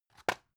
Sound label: drug package drop deep; berufe; arzt